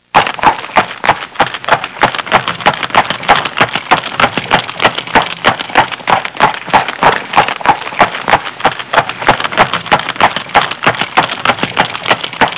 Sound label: horse trotting; Sound Effects for Multimedia - Volume 1